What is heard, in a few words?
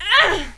grunt; kingpin; female; fighting